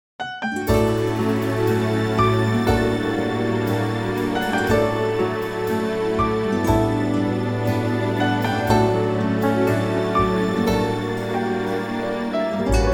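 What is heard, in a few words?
D to Eb, backing track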